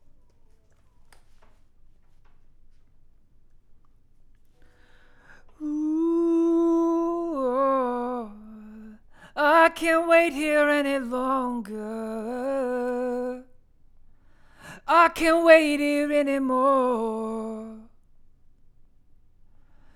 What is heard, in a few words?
Vocal